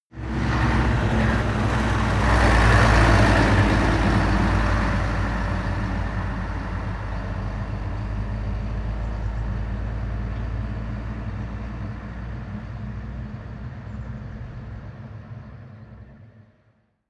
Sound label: truck construction site vehicle drive by